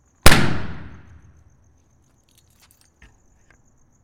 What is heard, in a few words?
reverb, art museum